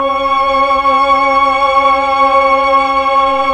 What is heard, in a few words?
PAD, Tron Str+Vox; Roland LCDP09 Keys of the 60s and 70s 1